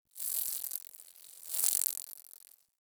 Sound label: Insect Fly By; Ambience; Misc Outdoor; Warframe; Infested Eris